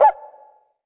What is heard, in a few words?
CUICA HI, HR-16 Drum Mashines; EdgeSounds